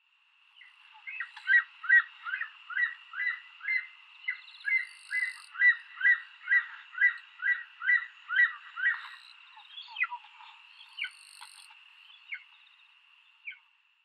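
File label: Golden Eagle; inuwashi; hyogo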